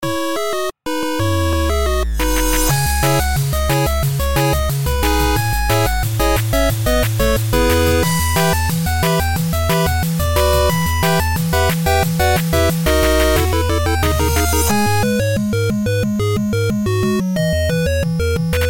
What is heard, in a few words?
8 bit